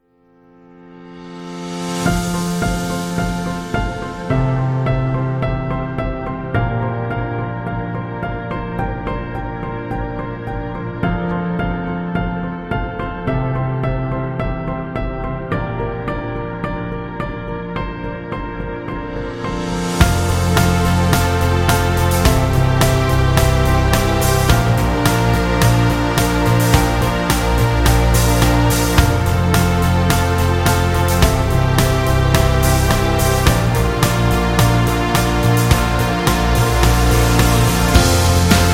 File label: Optimistic Piano; corporate success